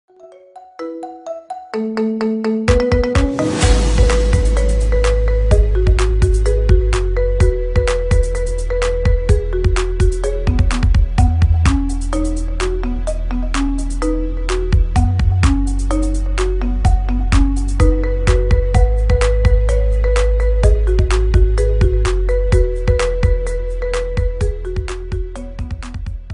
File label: marimba ringtone remix